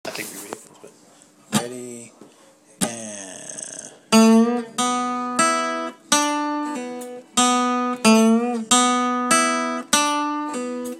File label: blues basic licks in e